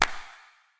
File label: real verb real; clap; Claps